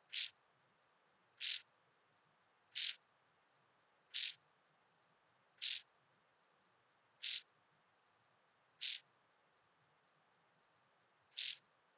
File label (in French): criquet duettiste chant d'appel; Le puech Cantal